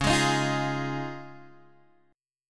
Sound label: Db Major Add 9th, Guitar, Open G